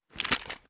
raise up; reloads; gmod; iw8

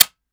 fire first; gmod; viper

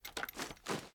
reload empty start, weapons; gmod; viper

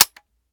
weap fire first; weapons; gmod

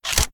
reload start load; gmod

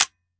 fire first; viper

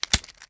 charge reload ejector rod; gmod

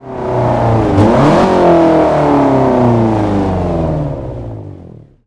throttle off, vehicles; gmod; tdmcars; new beetle

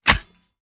reload fast mag in; gmod

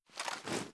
pi reload rotate; gmod

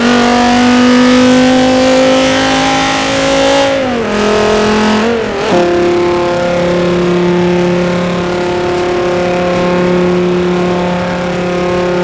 fourth cruise, vehicles; gmod; tdmcars; vip viper

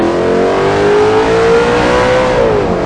second, vehicles; audir8

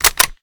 kilo98 rechamber scope bolt open; viper

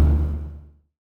stop, vehicles

